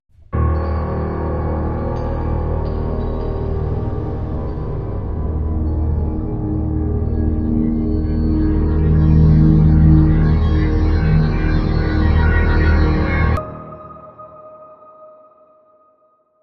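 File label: Crime Sting, No Percussion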